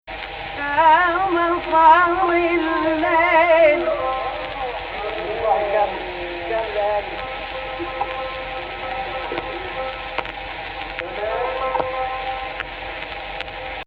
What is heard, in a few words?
AHH, Egypt Rast; Jins Rast in the upper octave; Actual Key: Rast on C